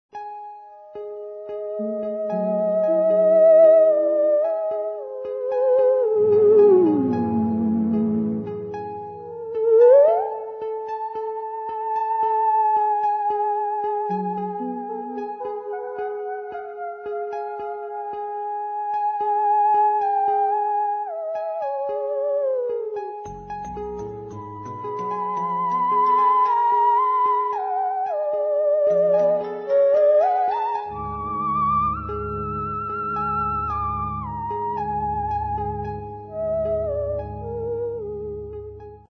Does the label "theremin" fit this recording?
Yes